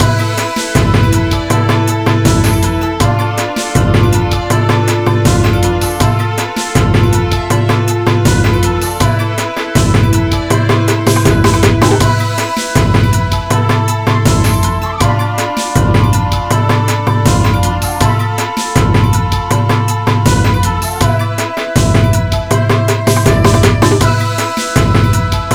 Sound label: BGM Ninja Hard; Irodzuki Tingle no Koi no Balloon Trip; NDS